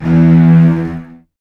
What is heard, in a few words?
Virtuoso Strings, TRM FX